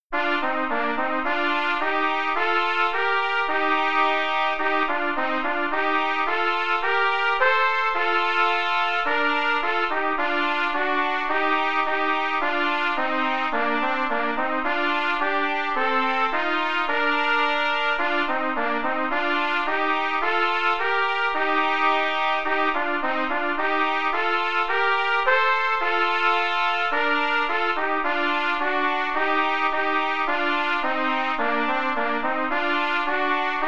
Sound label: Duets, qn=108, trumpet; Amsden Duets